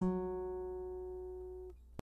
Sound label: string4 fsharp2